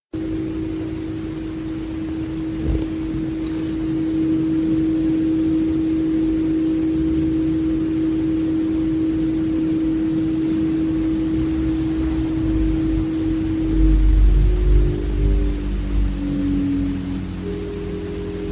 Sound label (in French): bruit split mitsubishi eteint amplifie; PAC Mitsubishi bruit split éteint; climatisation